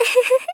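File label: Polite, Smile, "Uhuhuhuhu", Japanese female; Rei Yumesaki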